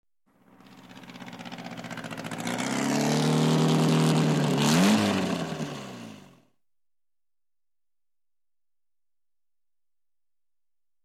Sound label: Motorbike Engine Noise Revving